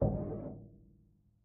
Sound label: thump squeak distant; Zomboid 41.78.16; Project Zomboid